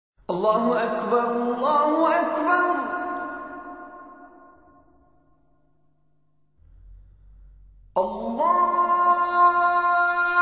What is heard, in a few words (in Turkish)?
azan sesi